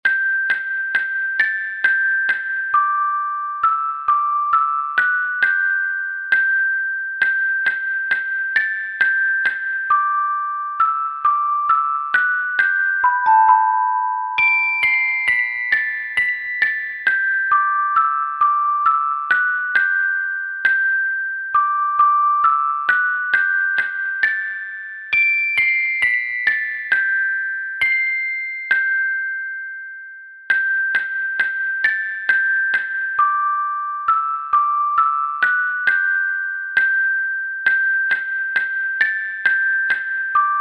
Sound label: children handbells; handbells arrangments